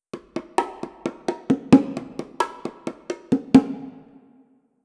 bonga

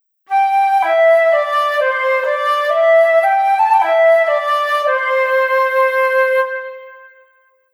flauta

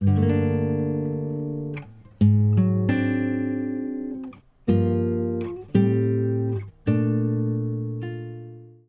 gitara

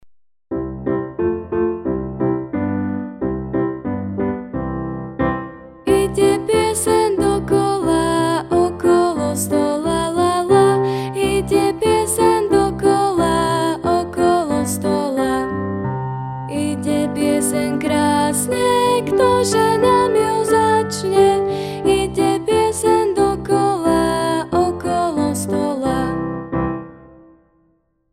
spev

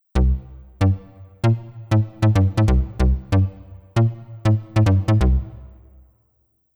syntetizátor

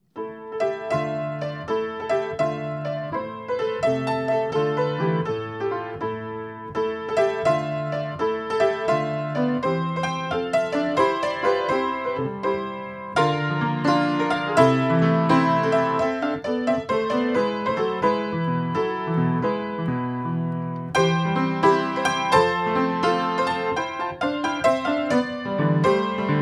gigue suita v starom slohu

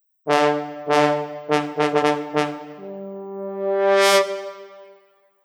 pozauna

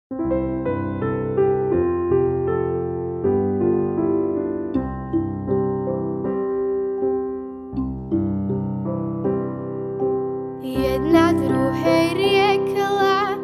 spev

